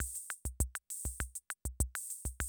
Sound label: CR78, DrumLoops